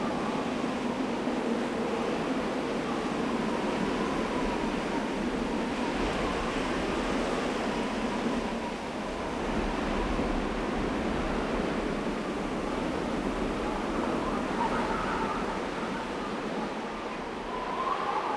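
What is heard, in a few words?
Ambience Golf Crowd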